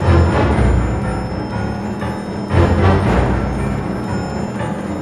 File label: GRO, Orchestral Loops; 128 PREDATOR